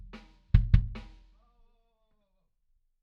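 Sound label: Bass Drum In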